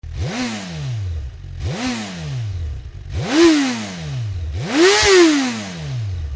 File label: gsxr1000, Suzuki